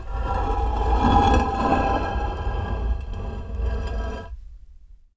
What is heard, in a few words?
stone, tones